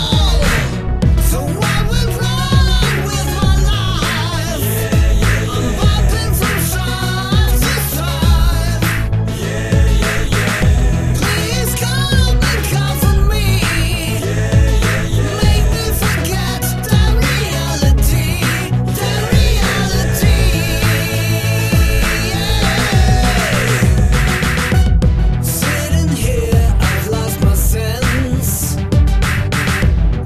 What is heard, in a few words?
Trance